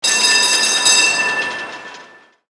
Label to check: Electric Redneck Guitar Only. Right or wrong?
Wrong